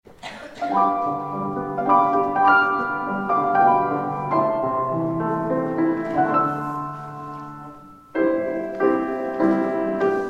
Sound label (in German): Stille Nacht Hollaendisch, internationaler chor; stuttgart